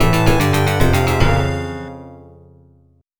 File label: arcade game over; golden axe